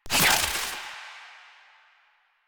Jade Scythe Spin; Weapons; Stalker; Warframe

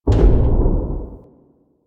Step B; The New War; Sentient Throne Room Gameplay; Erra

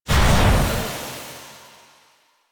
Tunnel Portal Enter; Warframe; Duviri

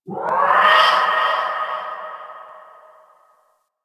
Night Distant Creature Large; Warframe; Ambience; Eidolon Plains; One Offs

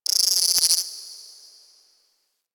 Ocean Critter Insect; Warframe; Grineer Ocean

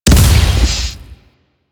Ship Dual Cannon Impact; Crp Moa Dual Cannon

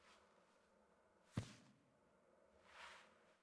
Puppy Foley Sit Idle To Down Idle; Warframe; Kubrow

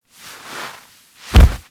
Kubrow Foley Idle Back Rub Fall; Enemies; Warframe

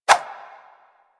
Spheres Throw Whoosh

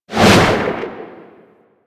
Melee Slam Generic Whoosh; Warframe

